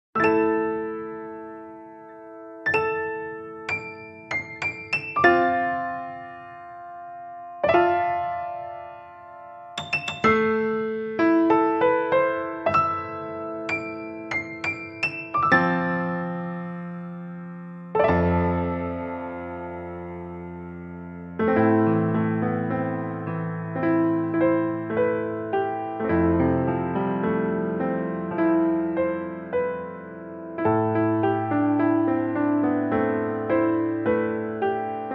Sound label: Touhou Piano